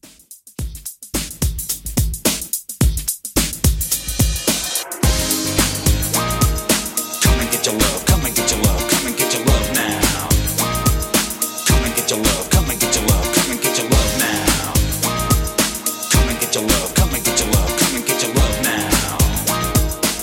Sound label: 90s Extended Classic 108Bpm